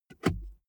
car bmw e46 interior center console close soft

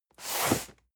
cardboard box medium slide hard; objekte

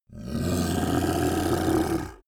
monster dark growl evil; kreaturen

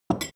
drinking glass put down; gastronomie; berufe

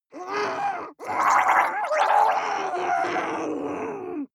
water monster female gargle angry; koerpergeraeusche; comedy-cartoon; soundpool

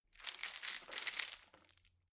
metal chain handle clean

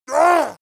yeti shout short pain

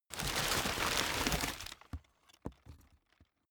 stuffing paper take out

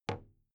big plastic case handle bounce soft; objekte; kiste

